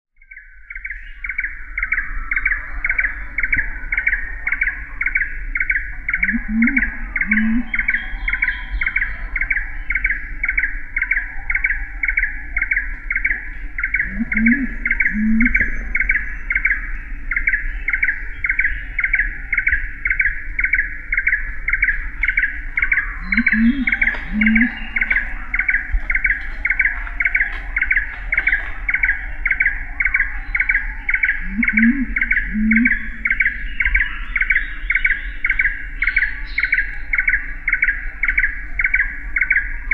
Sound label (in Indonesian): suara takur tenggeret